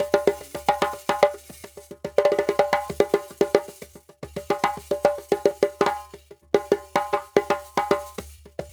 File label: Djembe with Kessing, African